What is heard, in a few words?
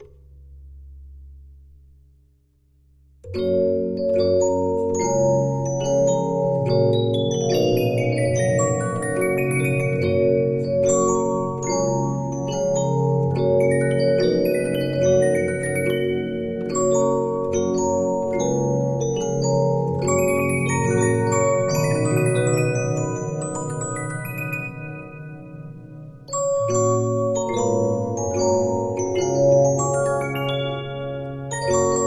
Regina, 15inch disc musicbox